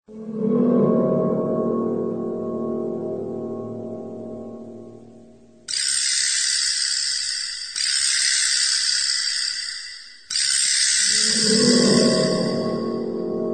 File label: ghost tone ringtone